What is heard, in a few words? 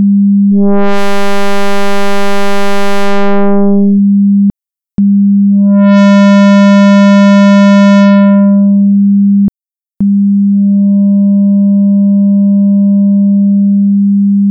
pseudo Analog 200